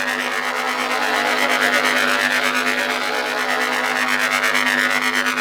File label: Moon Laser Medium Loop; Orokin Moon; Warframe